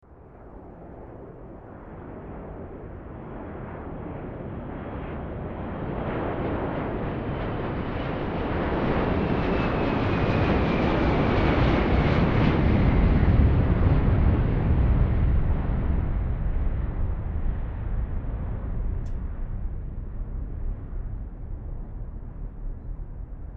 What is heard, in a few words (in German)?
duesenflugzeug; laerm